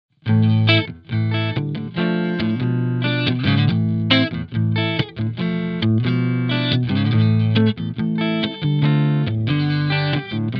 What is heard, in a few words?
clean; ampvergleich